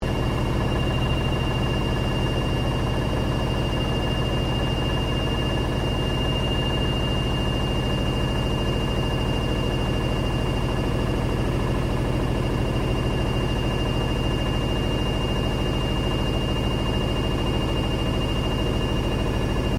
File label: Washing Machine LG Frontloader Spin Loop; Household Appliances; Laundry; Foundation4